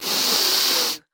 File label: Sniff Hard Male; sound effects bible